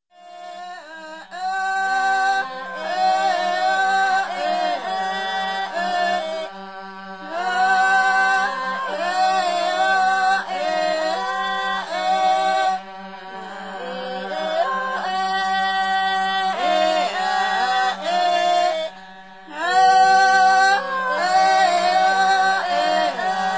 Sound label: Guadalcanal Women's Song